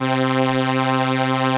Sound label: Solina C3; PWM